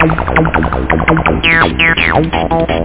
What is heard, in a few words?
303 loop low rate